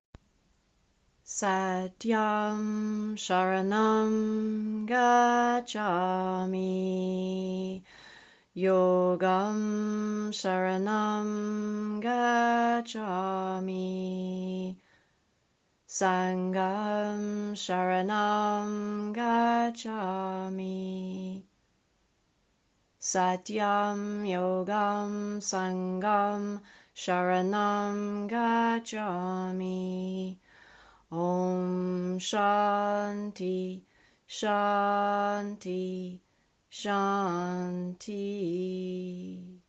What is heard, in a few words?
CLOSING CHANT, mantra yoga